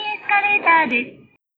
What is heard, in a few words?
escalator